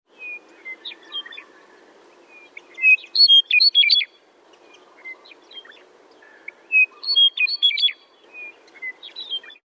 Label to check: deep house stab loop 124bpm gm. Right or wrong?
Wrong